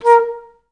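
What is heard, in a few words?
staccato A#3; Best Service; Peter Siedlaczek's Advanced Orchestra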